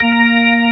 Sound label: Org2 A4#; Best Service Gigapack 2